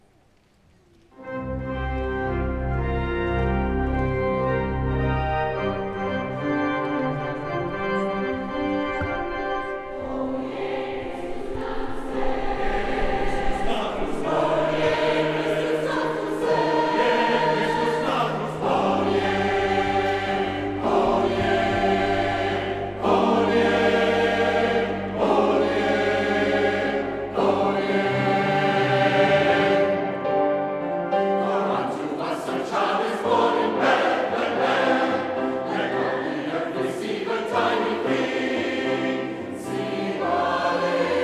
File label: Xmas Fanfare, choir; Althouse